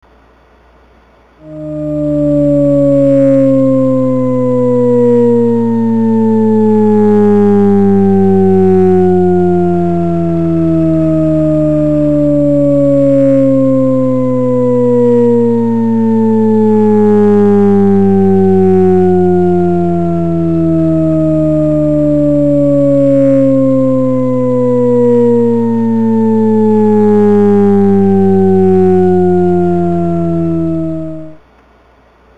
Risset

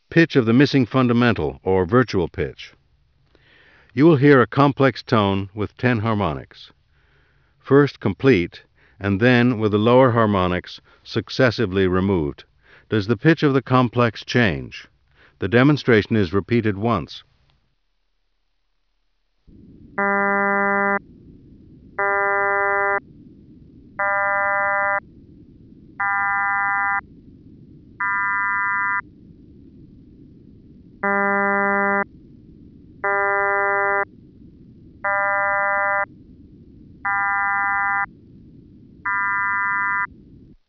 missfundamental

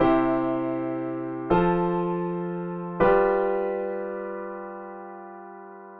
Chord Progression Incomplete

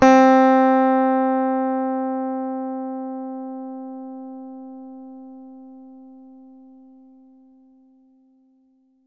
ELECTRIC GUITAR C4

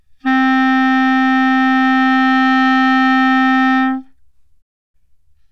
Bb Clarinet C4

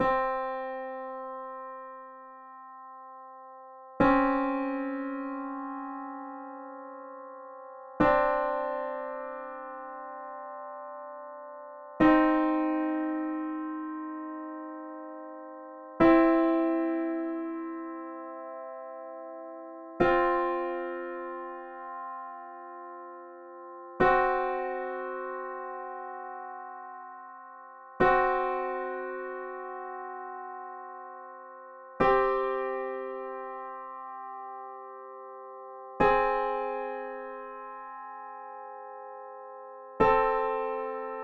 2 Note Intervals From C

